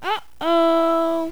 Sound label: UHOH